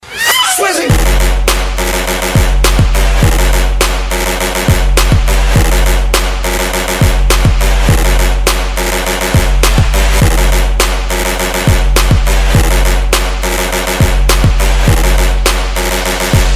Electro

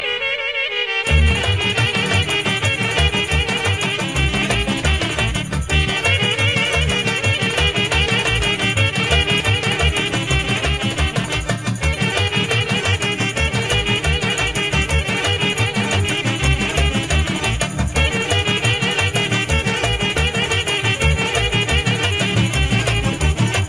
sms cocek

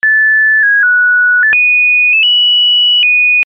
Minitor VI Tone

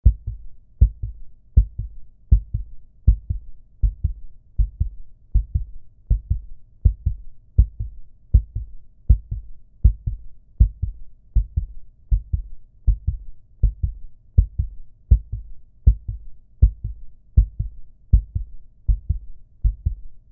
shortest sms tone; ringtones

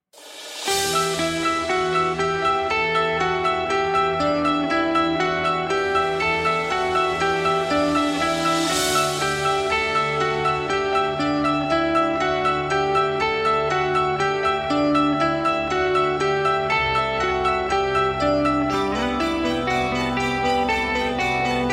Metal Cover

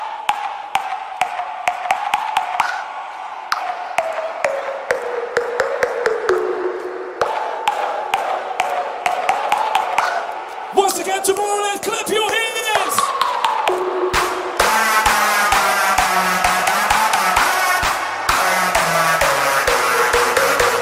ping pong

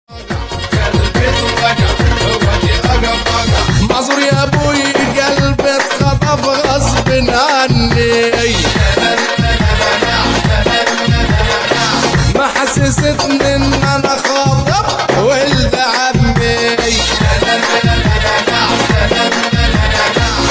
Folklore Trap

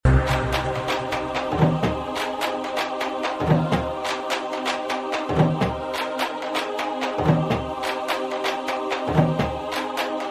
march

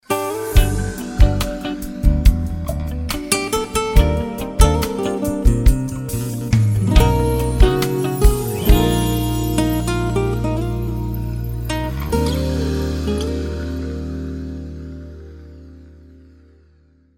guitar

